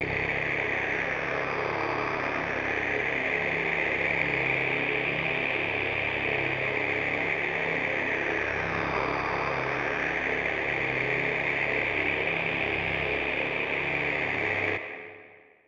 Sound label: Post Nuclear Storm Drone